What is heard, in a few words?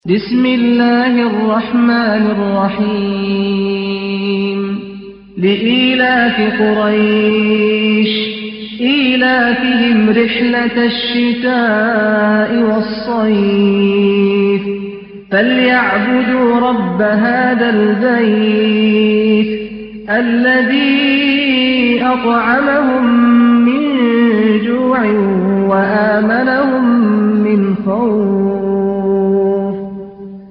Quraisy; Ghamidi; Murottal Syaikh Sa'ad Al Ghamidi